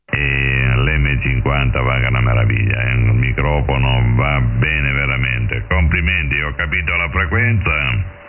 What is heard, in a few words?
vibro